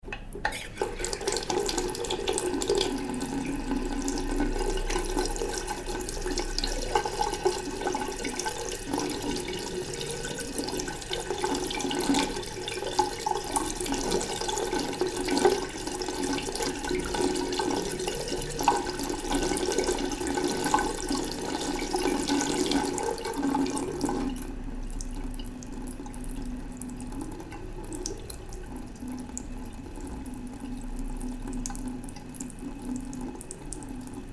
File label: Hotel sink Nha Trang Vietnam